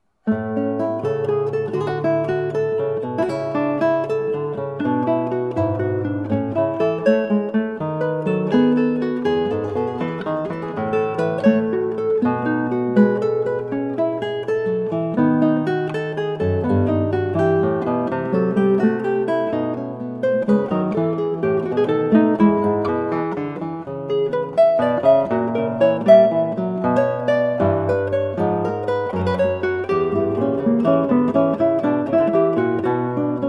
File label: 8 string guitar; Prelude 9 Book 1 Well-Tempered Clavier BWV 854; Johann Sebastian Bach; JS Bach on 8 string guitar, vol 2